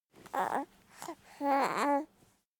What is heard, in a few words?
baby voice; anemoi